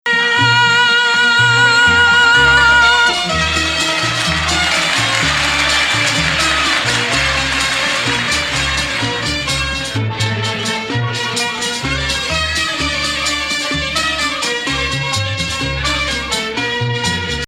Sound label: Rast Octave